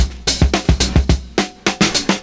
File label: 70s Breakbeats; USB Soundscan vol.46; AKAI